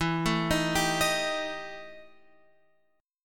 E Minor Major 7th Double Flat 5th Guitar Open F, EmM7bb5 chord; arpeggiated; JGuitar